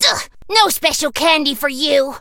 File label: hurt; mandy